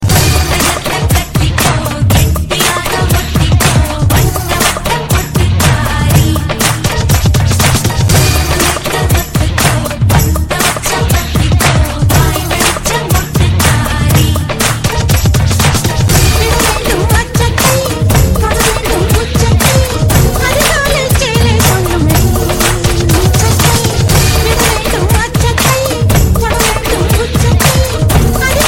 tamil latest tonez; ringtone

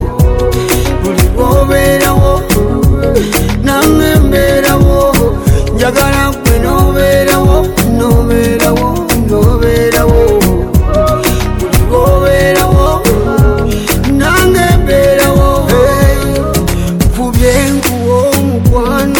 ugandan ringtone

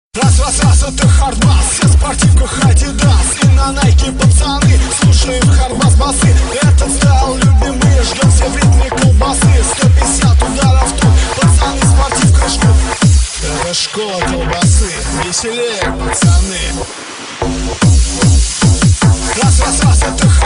hard bass; ringtone

